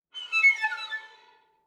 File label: Violin Slide Down